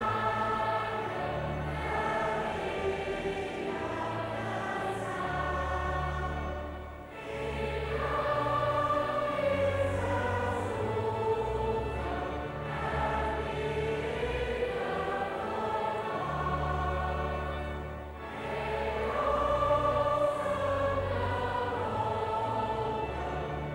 Koor dames

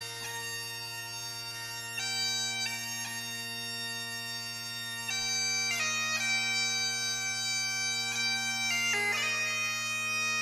Instrumentaal (doedelzak)

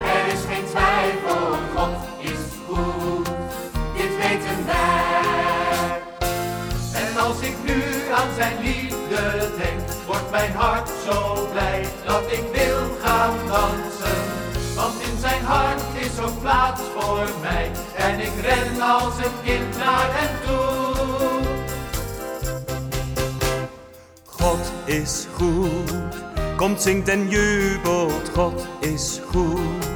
samenzang

